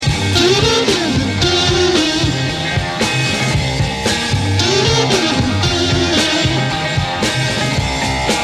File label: riff; Octavia